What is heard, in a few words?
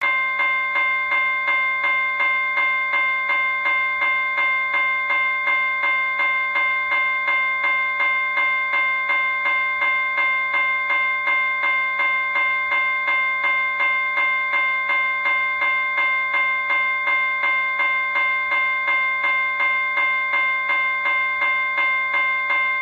Early GS, Electronic, General Signals